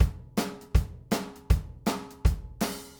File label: 160 Country Shuffle Drums, Very Fast Tempo; Pop Rock; Pro Tools Essential Loops; Digidesign